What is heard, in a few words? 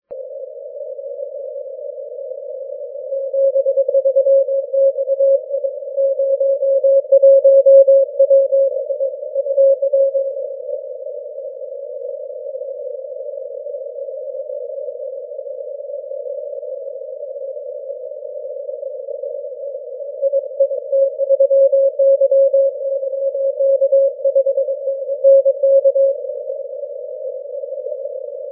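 80m continuous wave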